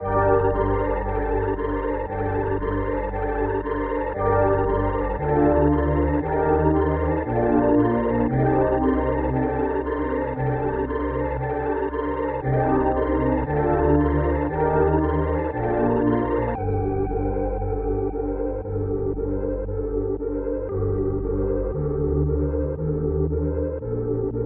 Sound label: 116 BPM G Min, Melody; Cymatics; 2022 Melody USB Expansion